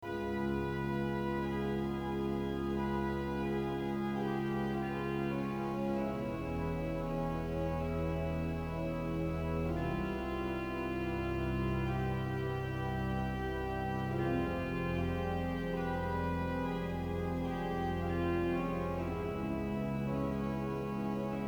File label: MEDITATION, organ